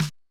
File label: Snare, mxr; classic machines